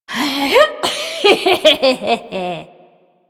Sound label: Lift Laugh, Corpus Lich; Warframe; Persona D